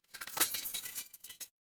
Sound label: impact crash